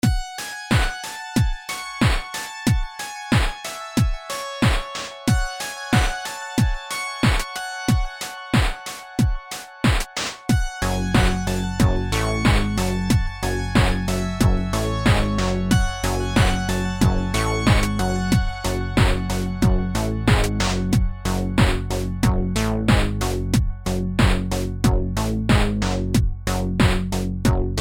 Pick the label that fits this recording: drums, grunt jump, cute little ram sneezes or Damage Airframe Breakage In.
drums